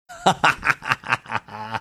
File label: cackling